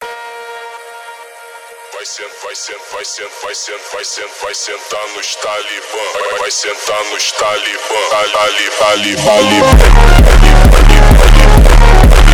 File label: montagem hardstyle remix